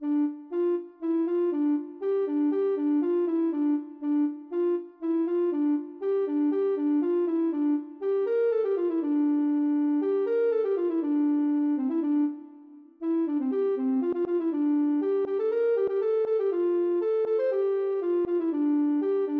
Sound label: ocarina